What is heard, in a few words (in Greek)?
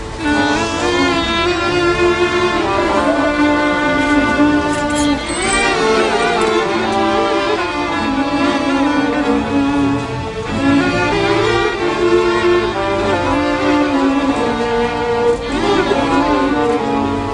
violi